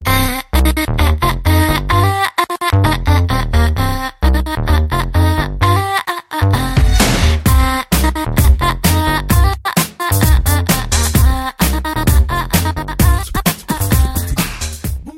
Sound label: Cute Tone